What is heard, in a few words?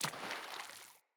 Sandals Run Water, Footsteps